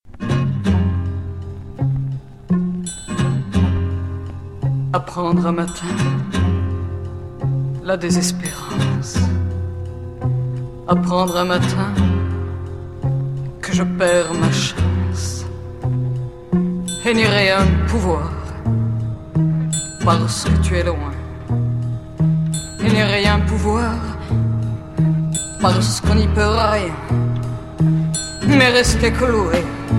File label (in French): le telephone